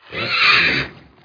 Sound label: mutant